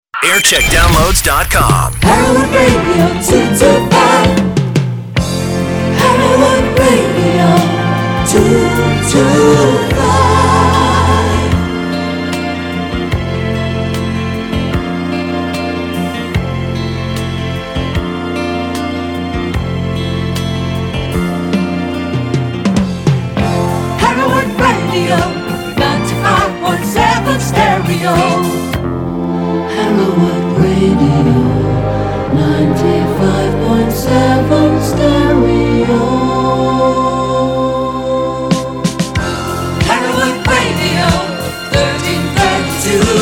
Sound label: jingle montage; hereward; 1980